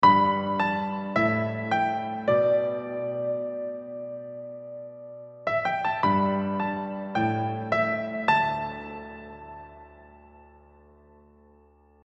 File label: Chorused Piano